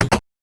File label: Sound Menu Back